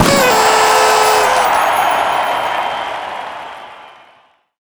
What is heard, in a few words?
party horn